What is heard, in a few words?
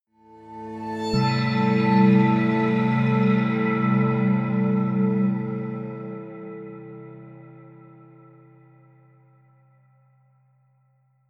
promo pad